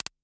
Notifications and Buttons

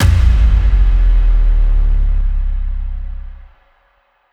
Impact

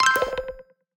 Open Voice Assistant

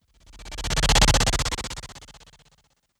Strobe Transition

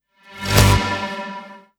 Simple Transition

